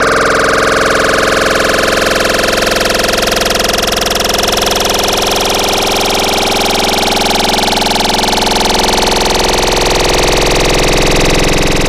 Full Vomit; Wavetables; Cymatics; Vomit for Serum Vol 2

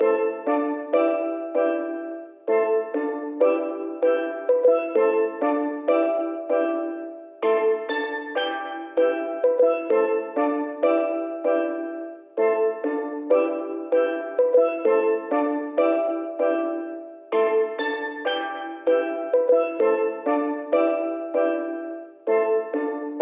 97 BPM A Min Steelpan; Cymatics; Destiny Gold Expansion; Kodak

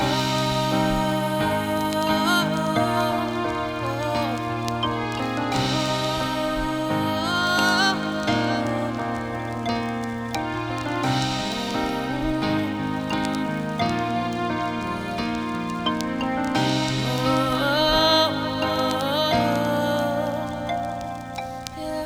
Full with vox, bpm 87; hiphop; StudioLinkedVST Vinyl Touch; Progression 31

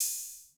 Open Hat Hit; big fish audio; Hip Hop Exotica; Cmin

